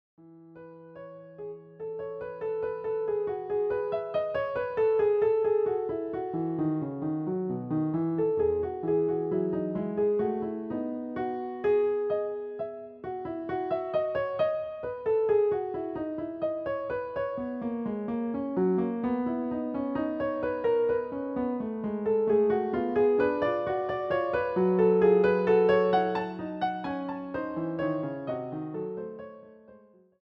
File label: Prelude and Fugue in E Major; WTC Book 2; J.S.Bach